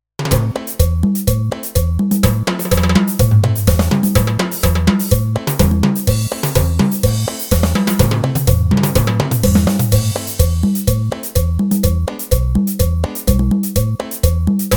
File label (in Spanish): Cumbia